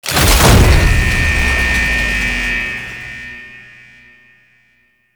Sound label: Elevator Start; Warframe; Jade Shadows; Ascension